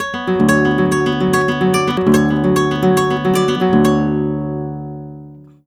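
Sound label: arpeggio guitar, Flamenco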